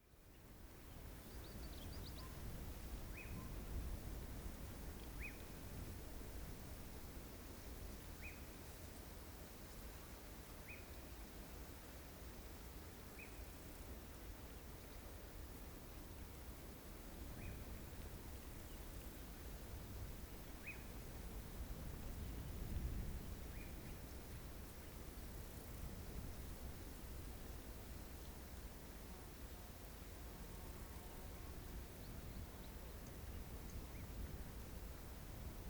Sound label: african bush midday breezy